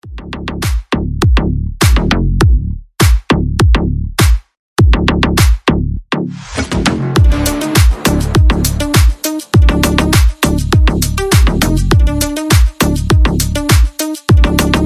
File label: Car Bass